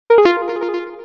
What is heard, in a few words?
Notification Ringtone; Vivo S18 Pro